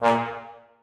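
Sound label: trombones staccato a#3; Sonatina Symphonic Orchestra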